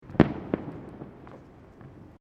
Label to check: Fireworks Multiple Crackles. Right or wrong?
Right